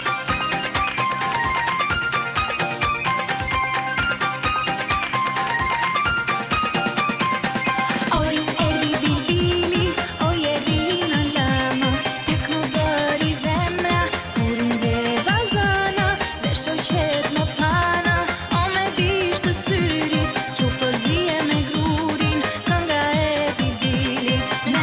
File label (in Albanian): Muzike Shqip